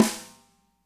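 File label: snare left; Spectrasonics; Burning Grooves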